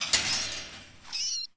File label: Animal Trapped Metal; Project Zomboid v41.73